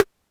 polyvox rimshot, Drum Machines, Formanta Radio Plant Polivoks